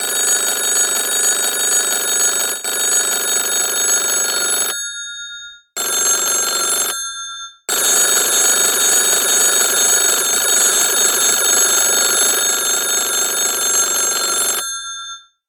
telephone

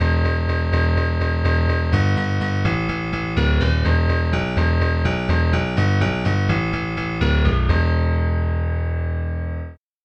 bright yamaha grand bass; SpectMorph Evaluation #4

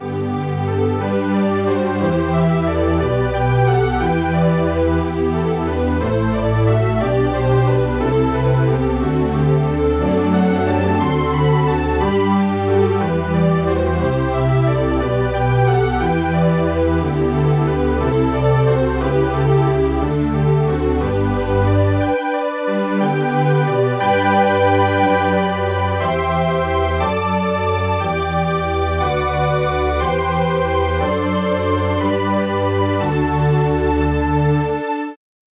halo pad bach

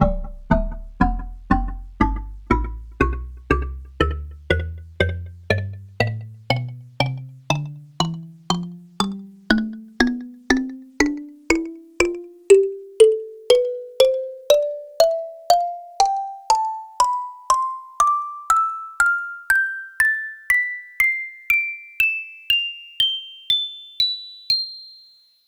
kalimba tonleiter

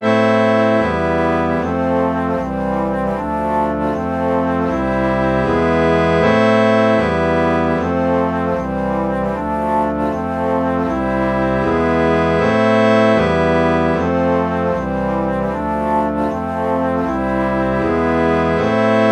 reed organ alto flute chord morph

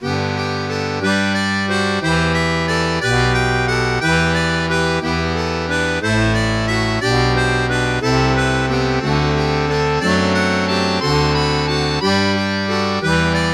harmonica

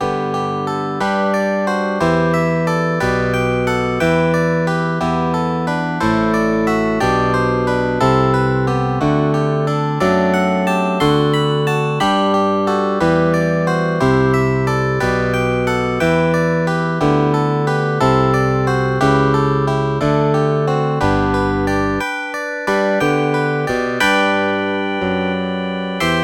picked bass

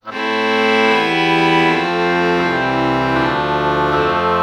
oboe violin chord morph

